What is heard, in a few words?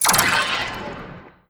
impact, physics, vault